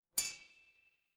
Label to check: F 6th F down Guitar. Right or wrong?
Wrong